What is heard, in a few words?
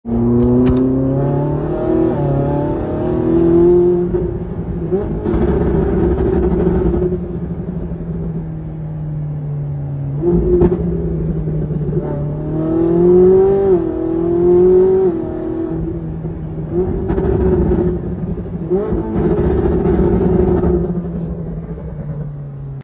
popbang